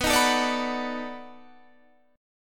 B 7th Sharp 9th, down, Guitar, Drop D

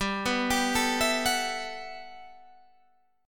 G Major 13th; Listen to GM13 arpeggiated; GM13 Chord

